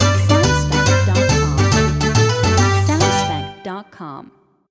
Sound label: Italian Tarantella Dance C Signature